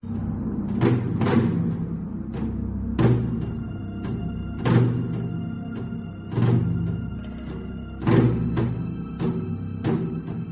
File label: Jungle Music; the emerald maiden windows 2016